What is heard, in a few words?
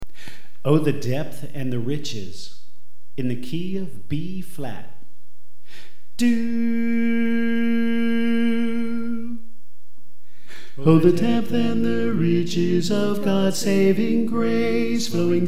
Tenor